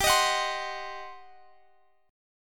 G Major 7th Suspended 4th Sharp 5th, G, down, Guitar, Half Step Down